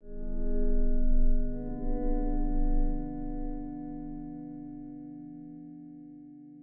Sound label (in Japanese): kane no ne; musicbox